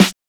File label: Snare, Drum and Bass